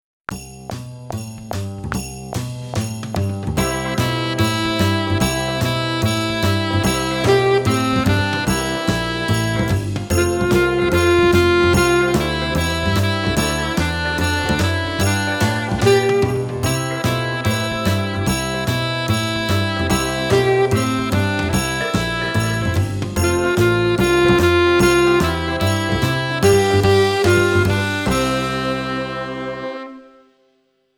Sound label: Jingle Bells